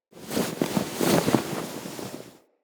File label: Tent Cloth Rustle, Interior; Soul Plane